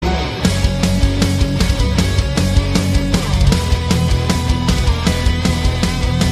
metal cover; metin2; enter the east

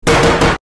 hits the table

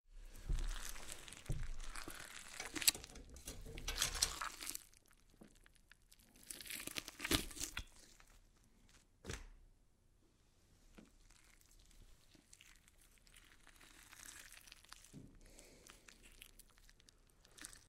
meat squelch

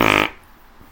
horse butt fart

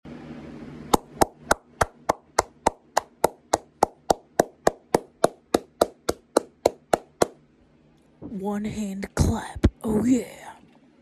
one hand clap

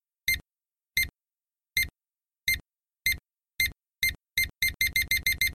bomb beep

